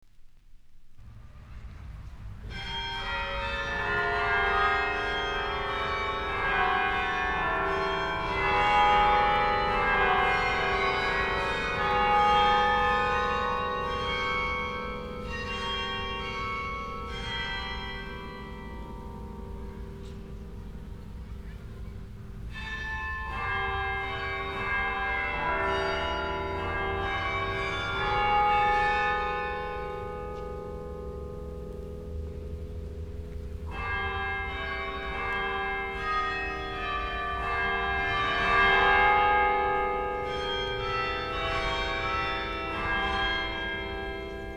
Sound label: Music for Carillon; EMI Electrola